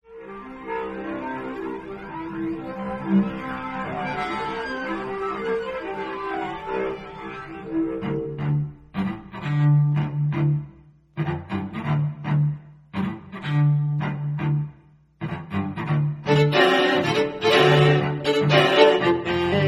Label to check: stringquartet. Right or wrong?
Right